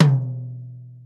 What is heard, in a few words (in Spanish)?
Tom De Bateria